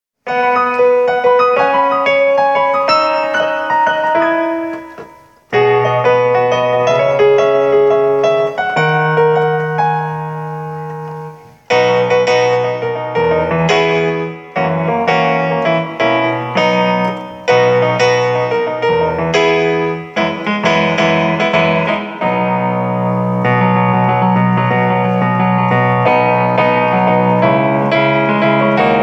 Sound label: christmas song backing track